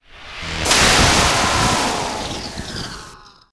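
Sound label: wizard fire hit; DAoC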